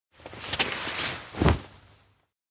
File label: raise arm pose; anemoi